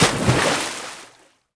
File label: Splash